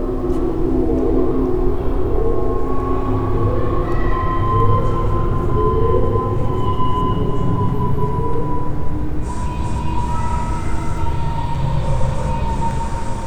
Horror Ambience; Sonic Foundry (Sony Creative Software); The Electronic Music Manuscript Collection

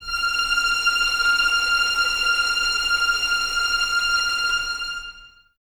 VS F 6 Tremolo, 23 Violins; Miroslav Vitous

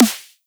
metallic

